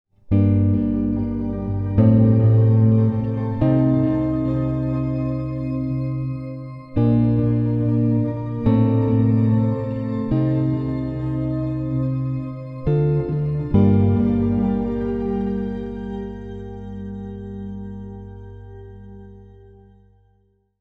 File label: octave shifts